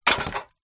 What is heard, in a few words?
household trash into trashcan cans glass